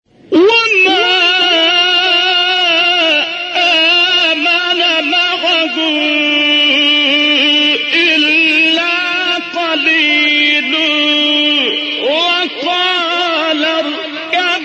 تلاوت دلنشین از آیه تا سوره مبارکه هود; شحاته هلالی; 41

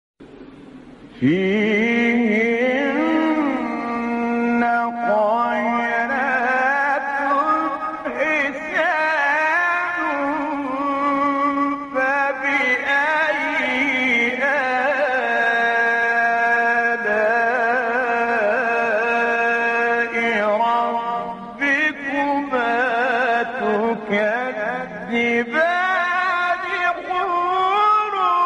تلاوت زیبا از سوره; الرحمن; استاد عبدالعال

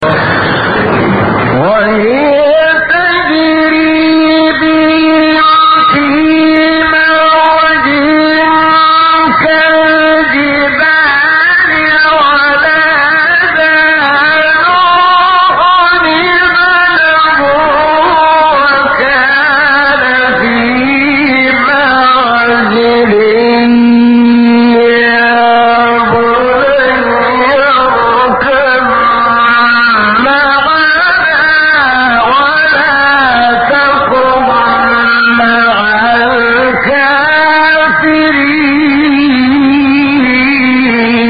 تلاوت زیبای آیه سوره; 42; هود; استاد شحات محمد انور

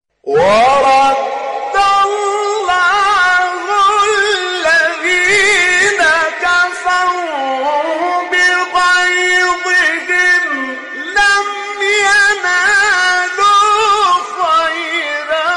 تلاوت آیه سوره احزاب; آیه 25; استاد هنداوی

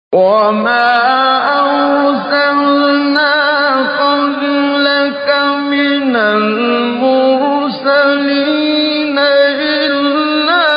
تلاوت زیبای آیه سوره فرقان; استاد منشاوی

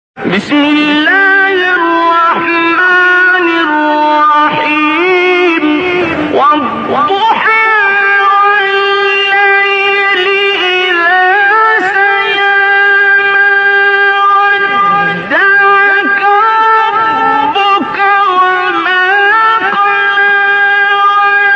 فراز زیبای ابتدای سوره; ضحی; استاد عبدالباسط

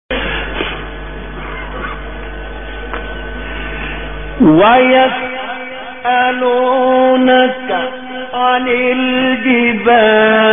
تلاوت زیبای از آیات سوره; سعید مسلم; طه

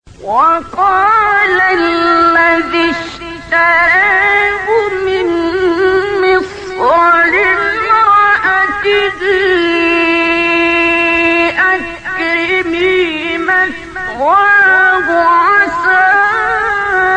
شاهکار تلاوت آیه سوره یوسف; استاد عبدالباسط

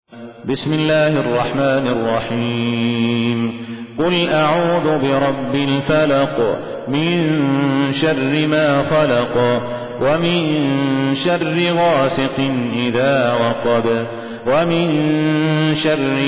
ترتیل; صالح الصاهود; سوره فلق

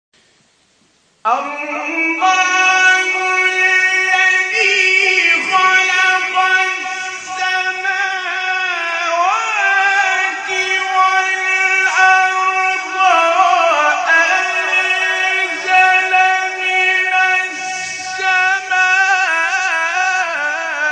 شاهکار تلاوت آیه سوره مبارکه ابراهیم

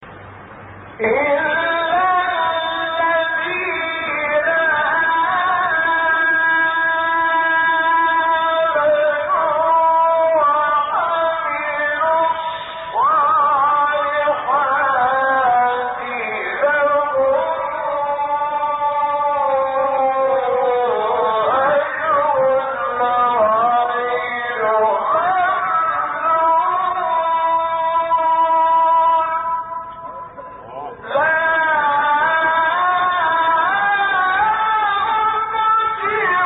تلاوت آیه سوره انشقاق; استاد شحات انور

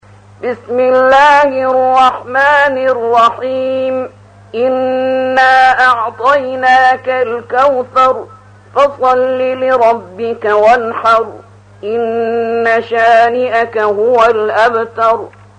ترتیل سوره کوثر

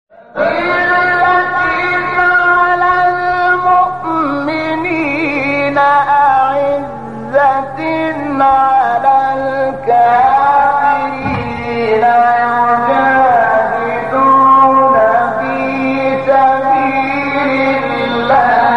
فراز زیبا از آیه سوره; 54; مائده; استاد شحات محمد انور

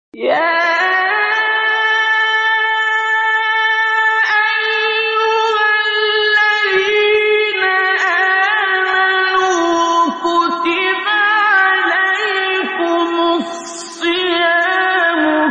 تلاوت آیه واجب شدن روزه سوره بقره; استاد عبدالباسط; 183

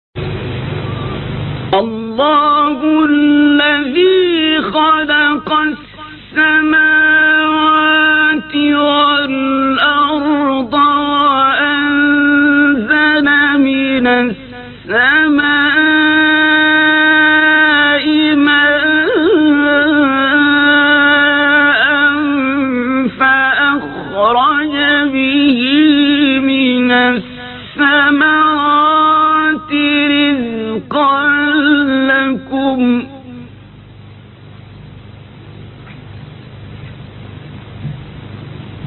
تلاوت زیبای آیه سوره ابراهیم; عبدالباسط

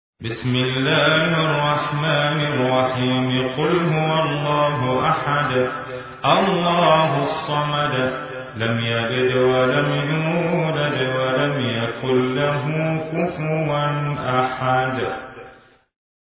ترتیل قاری مصری; سوره اخلاص

